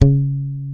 SADWSKY MUTE, Bass; Sampleheads; Will Lee Bass Library; SADWSKY5MUTE

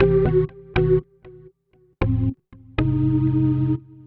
Tec Org, Keyboards

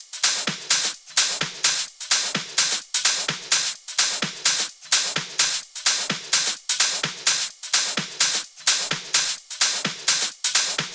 Loops 128BPM, Drumloops

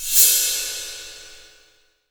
22INCH ZIL RIDE, Ride Cymbal

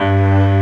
Jingle loop; SNES; Romancing SaGa 2

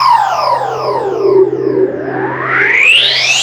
NRG SFX, Trance

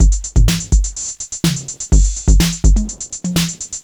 drum loop electro full 125, Classic Chicago House; Sample Magic; Drum Loops

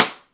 STICK, Drum 1 Dry; East Collexion

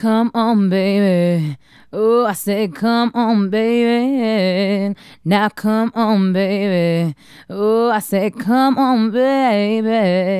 Female Solo, Hip-Hop and RnB Vocals

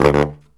Killer Brass Riffs

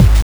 Crushed Perc, bitcrushed; musicradar

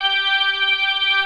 PIPE ORGAN

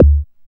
BD ANALOG, Synth; Sound & Vision - Gigapack II CD 2

